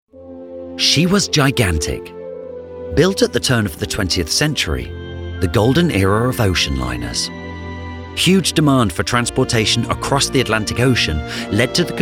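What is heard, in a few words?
Documentary Historical Factual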